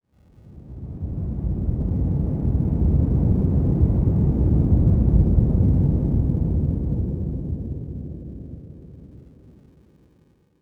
cave occasional

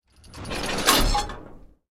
rocket reload end

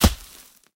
bullet dirt; War Thunder

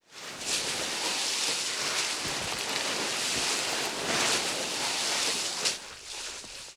drag; coup; from al asad; Call of Duty Modern Warfare

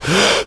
breath in; Modern Warfare; weapons; foley

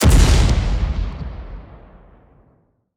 impacts; World of Tanks

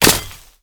physics knife dirt

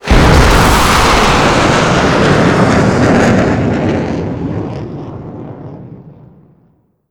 mig29 sonic boom; Call of Duty Modern Warfare

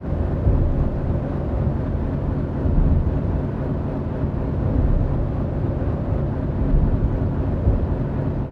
submarines engines; World Of Warships

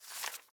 ui pickup paper; Rust

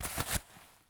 medkit med take; item grizzly; Escape from Tarkov

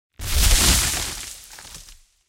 tree collision

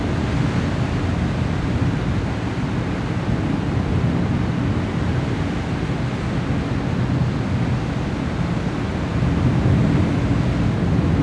wind; World of Tanks Blitz; halloween 2018

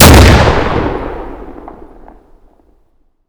Inf Weapons; Red Orchestra 41-45

